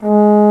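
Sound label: Trombone p G#2; Digital Sound Factory Virtuoso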